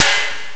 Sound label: air snare; jazz jackrabbit 1; M8